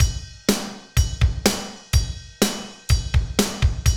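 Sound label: medium tekno, Roland drum beats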